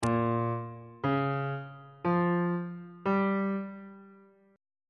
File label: a sharp minor 6th chord intervals